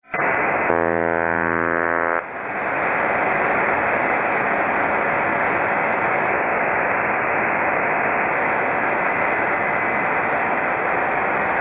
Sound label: Ionospheric Sounder or OTHR Radar